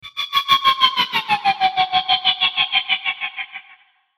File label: Chopper Descend Filter Reverb; Blastwave; Chopper Sound Effects